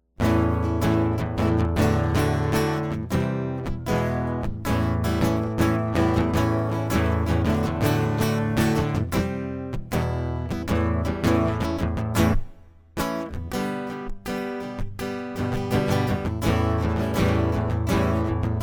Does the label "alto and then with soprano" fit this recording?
No